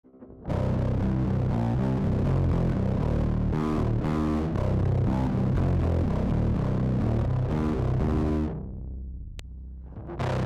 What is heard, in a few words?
DI fuzz